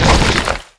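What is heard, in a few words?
Se Dungeon Door; Etrian Odyssey III HD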